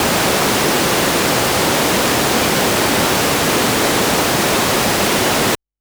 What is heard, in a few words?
Modular Raw, White Noise Raw; noise hiss crackle